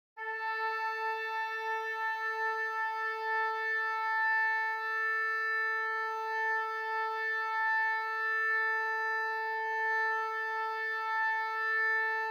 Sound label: Oboe tuning note